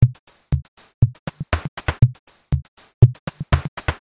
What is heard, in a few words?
depressing rock music